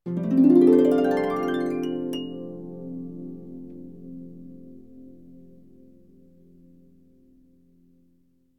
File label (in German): Harfe; Crossbow Warrior The Legend of William Tell